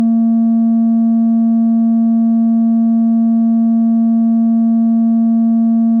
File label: Osc 2 Sin 220